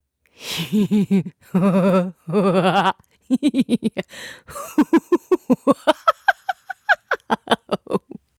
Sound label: Laugh